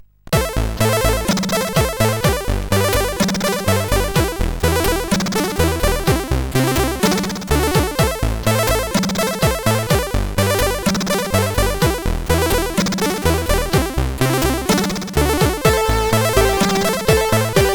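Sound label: melody wave, MOS CSG8580R5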